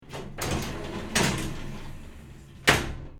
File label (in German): Duschkabine schließen